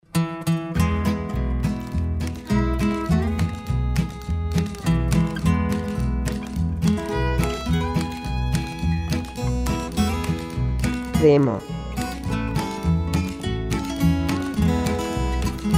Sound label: Country